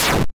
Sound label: railgun; Mindustry